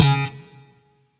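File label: Shawzin slap C sharp, octave three; Warframe; Sentient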